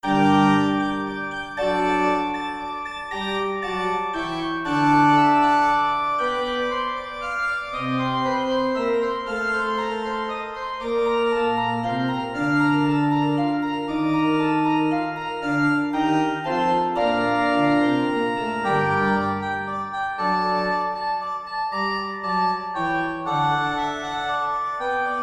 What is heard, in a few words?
Organ Minuet in B Flat Major